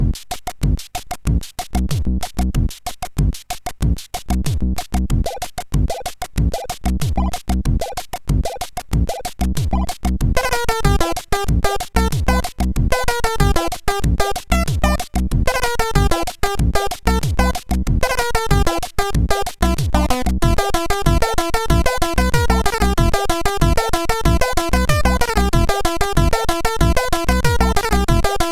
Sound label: Jazz, MOS6581; MOS6581R4; hvsc